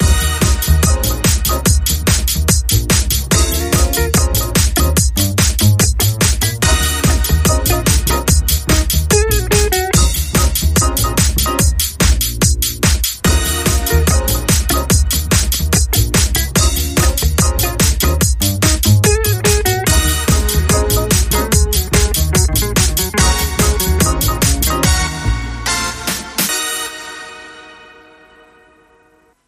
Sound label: Swing Woose